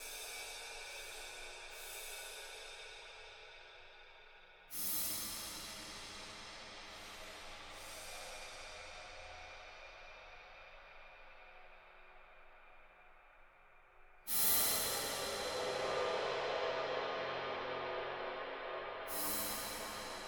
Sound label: Cymbal Mood, Psychotropic Cymbals; Ambient Cinematic